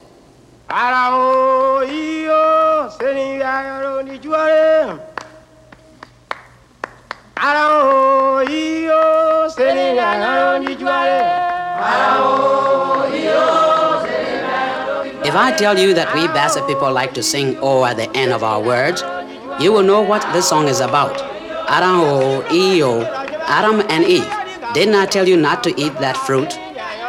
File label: bassa choir